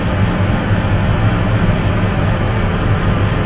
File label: Machine Generator Loop